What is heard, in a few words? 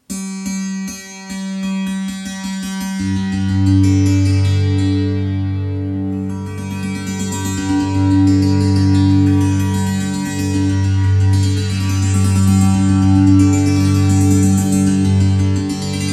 Monolini in G